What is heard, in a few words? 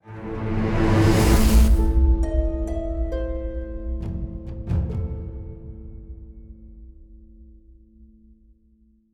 Defeat; Mistover